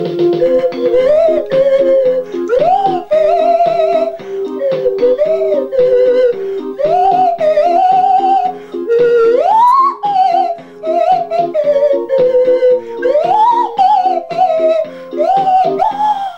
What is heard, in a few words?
Slide Whistle